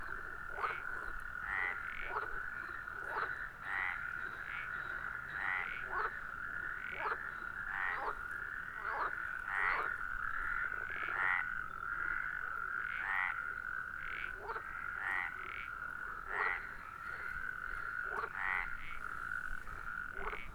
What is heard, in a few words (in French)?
amphibiens lac tuilerie venerque calamite rainette pelodyte